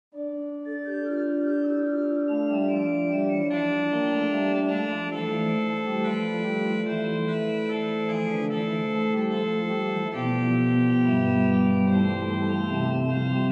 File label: Noel, Organ Music, Souvigny; Boely